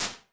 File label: slap room; Convolution; Small Rooms; IR Samples